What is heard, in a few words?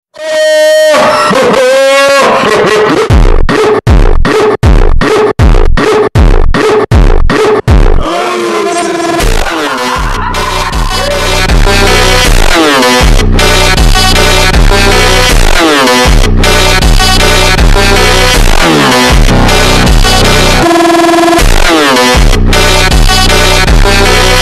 korean funk super slowed